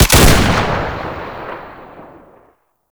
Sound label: Sniper Drone Shot; Warframe; Lasrian